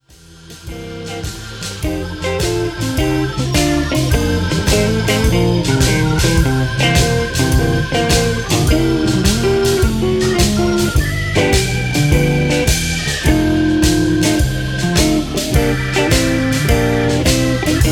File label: Chicago Blues in C